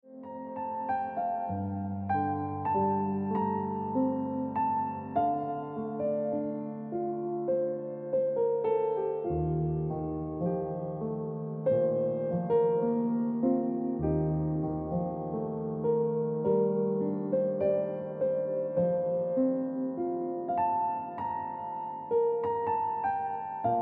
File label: Piano Music